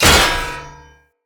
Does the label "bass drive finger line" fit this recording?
No